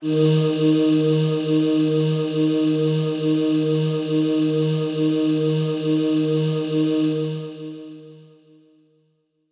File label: Voices Kawaii D#2